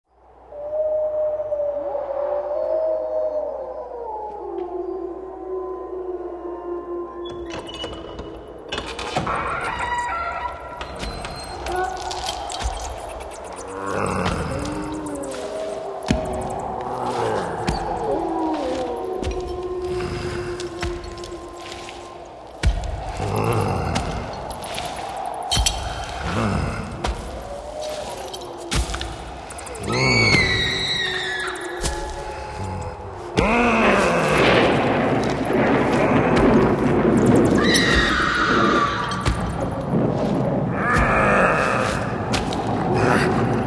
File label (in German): waldmonster